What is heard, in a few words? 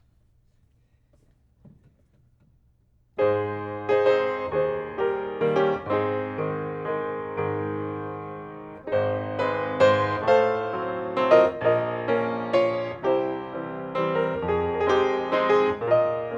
Ab waltz 42bpm; DonQ